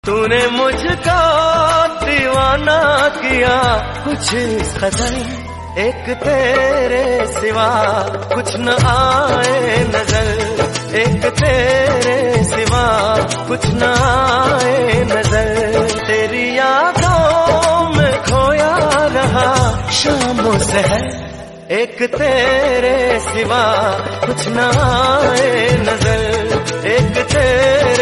Hindi

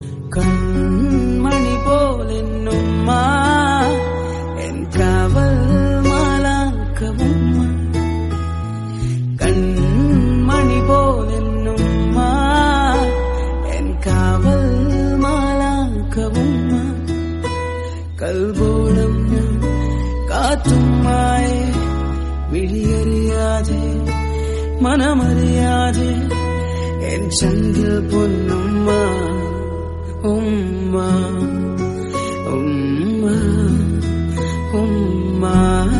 Tamil Song

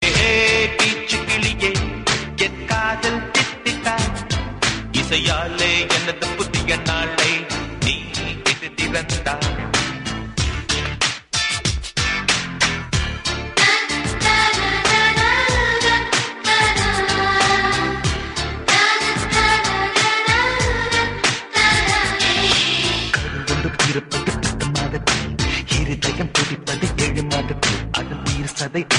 Tamil Ringtone; Mugavaree

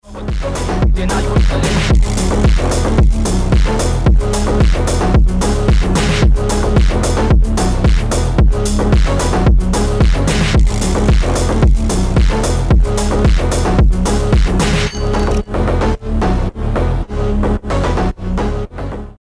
Slowed Ringtone

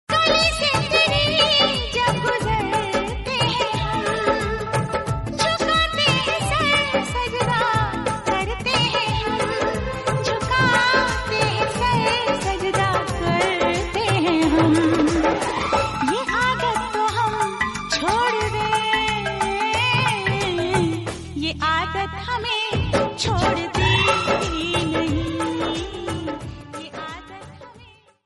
Hindi Song

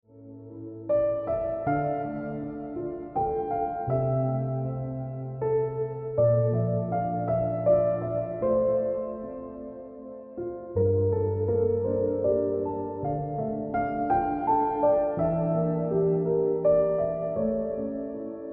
Ringtone Piano Music

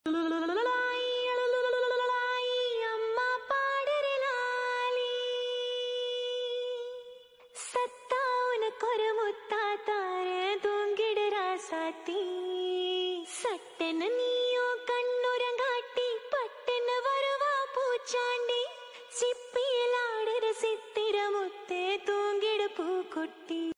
Sister Song Tamil

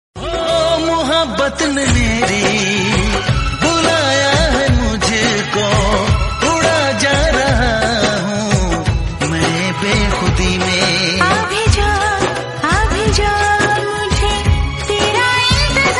Romantic Hindi

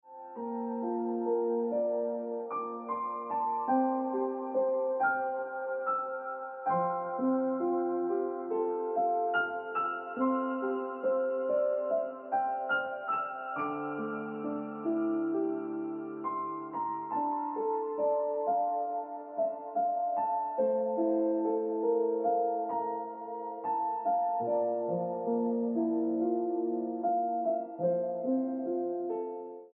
Piano Music

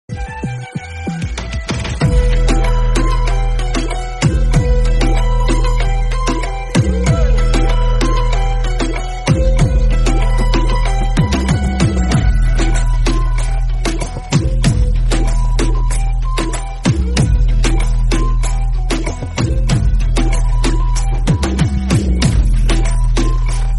Bgm Ringtone; Thunivu; Tamil

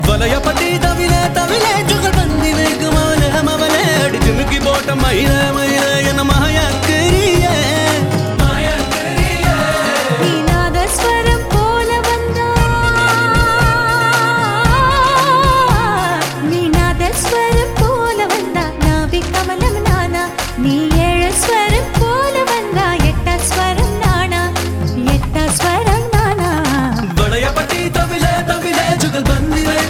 Tamil Ringtone

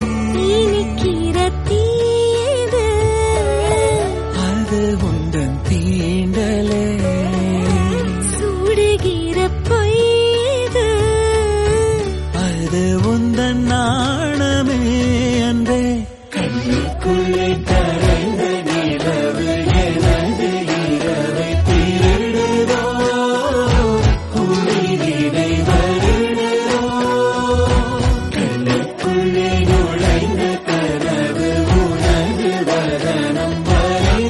Tamil Song

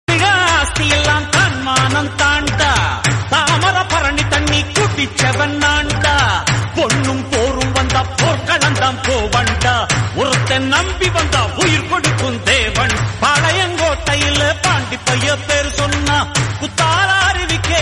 Tamil Song Ringtone

